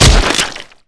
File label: rifle swing hit infected, melee; weapons